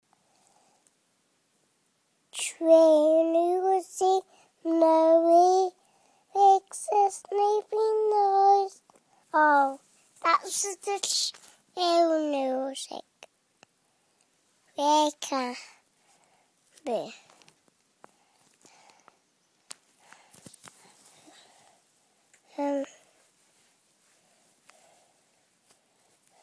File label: singing